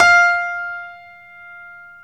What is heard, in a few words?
StereoGrand, Keyboards